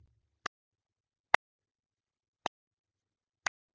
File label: clapping oracle; dereverb